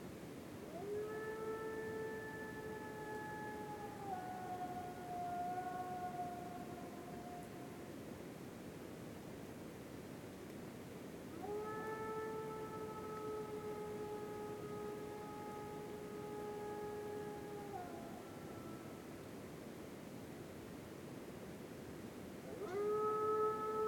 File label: WINTER Forest night dark moon with distant wolves howls, North Laurentian Woods, Canada